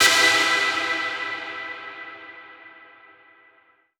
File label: note icy chord; Polyend; Recue; melodic